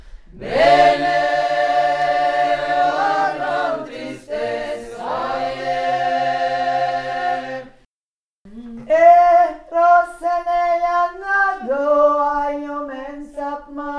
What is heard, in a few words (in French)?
VOIX 2; Be nei ua gran tristessa